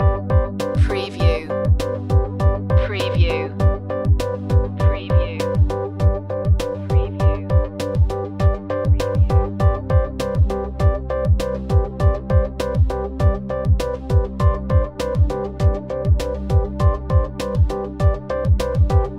scientific discovery loop

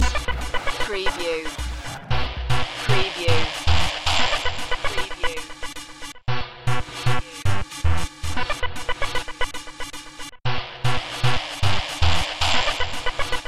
hit me loop

